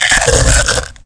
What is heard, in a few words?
bites